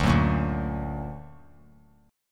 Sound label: D Augmented D down; D+ strummed; JGuitar